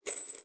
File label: Coins